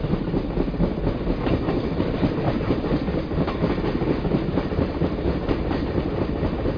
Train

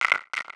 roll; GNU Backgammon

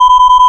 WEAPON HOMING; worms

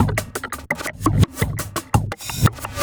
Abstract, Loops; Cyclick Samples; Kit One

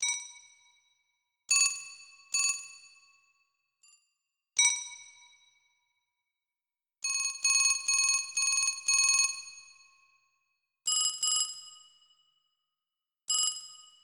birds

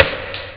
double, drums

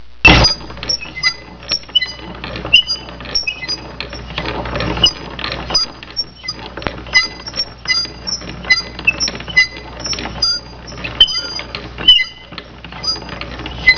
squeaky crank, sound effects, squeaks; pinnacle; english

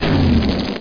fireball; THE REALM

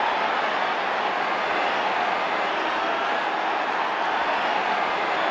crowd; afl99demo

